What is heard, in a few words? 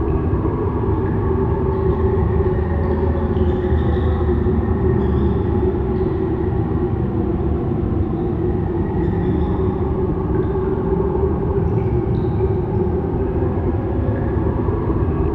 Cave Ambience; Level3; Journey